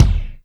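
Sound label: kick Flanged, Alesis D4; 300 Drum Machines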